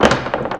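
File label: boat creak; The Elder Scrolls III - Morrowind; Morrowind GOTY GOG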